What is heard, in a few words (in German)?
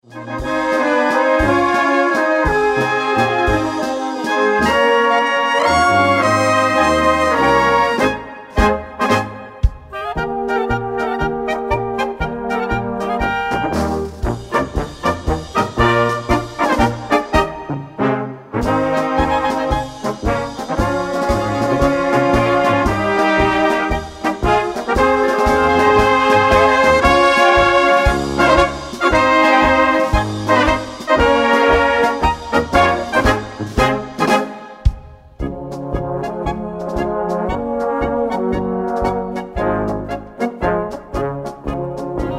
Blaskapelle